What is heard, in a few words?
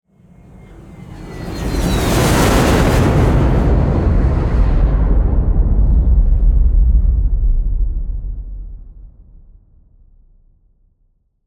spaceship fly by big long rumble; fvtt sonniss gdc audio